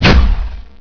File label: red rain fire; Heretic II demo; weapons